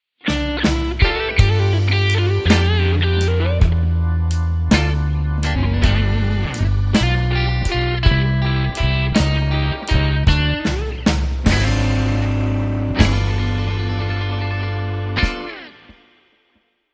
Blues, Sting

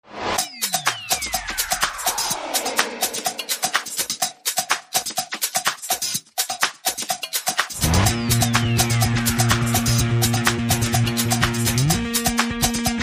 Groove, Percussion Bass and Effects

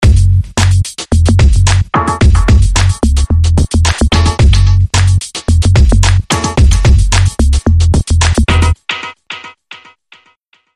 Bass Funk Tech

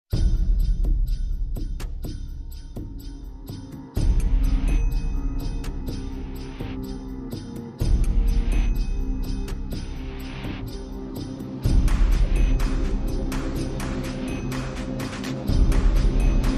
Drums

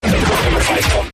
Quick Jumble

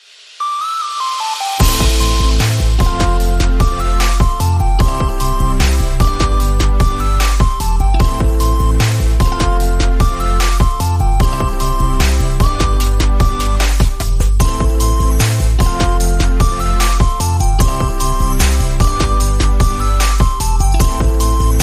No Strings

